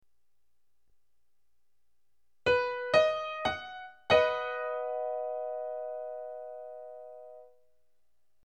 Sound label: triad